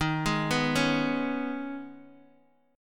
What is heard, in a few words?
Eb 7th Suspended 4th Sharp 5th, Guitar, Open D